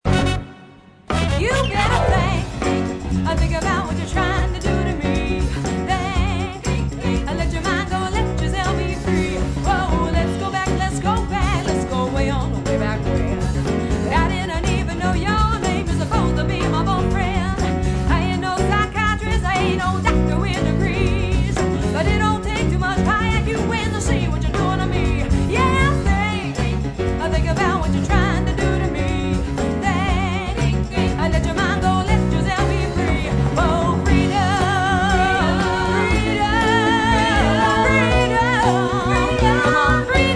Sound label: vocals